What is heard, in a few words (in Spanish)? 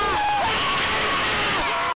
grito aaaa